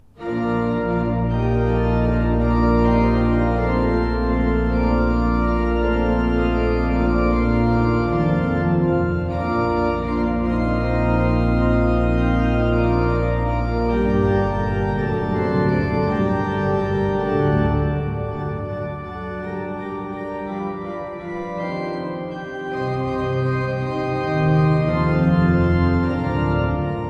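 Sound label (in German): Seifert Koeln, pfeifenorgeln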